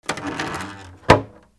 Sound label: SQUEAKS